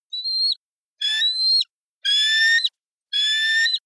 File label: eagle african fish call; natur tiere; voegel